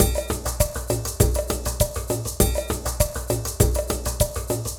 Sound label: Cave Ceremony, percussion; Sonic Foundry (Sony Creative Software); Joe Vitale Latin Percussion